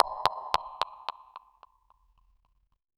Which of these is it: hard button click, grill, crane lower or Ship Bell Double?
hard button click